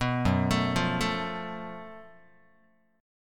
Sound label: Gb Minor Major 7th Double Flat 5th Gb Guitar; arpeggiated; 2,2,0,3,1,x; 4A,4E,5A,5D,5G#,6C#